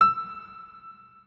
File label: Piano E4